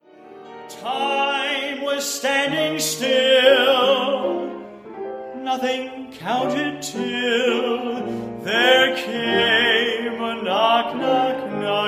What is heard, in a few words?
Music Theater; Internet Session, New York; Broadway